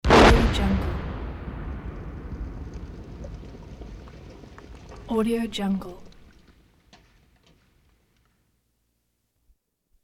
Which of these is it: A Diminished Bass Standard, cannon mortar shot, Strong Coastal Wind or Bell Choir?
cannon mortar shot